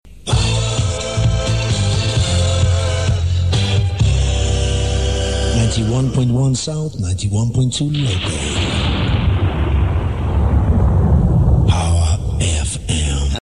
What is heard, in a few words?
sung male; jingles; power fm